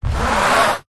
household canvas frame picture large fingernails rub scrape weird tone; zapsplat